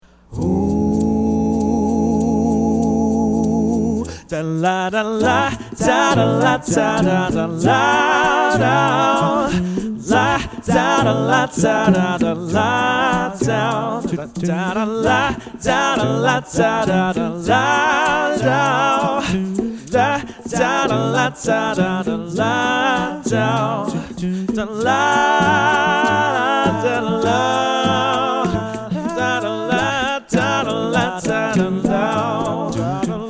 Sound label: cheezy jingle